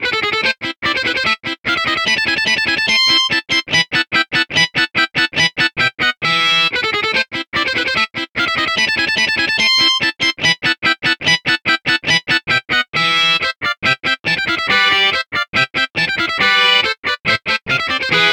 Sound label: overdrive guitar mozart